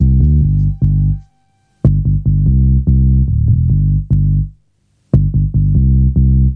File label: 073 gainramp ragabass, bass loops